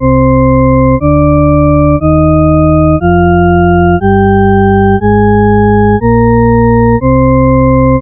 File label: minor, shepard; Nyquist Example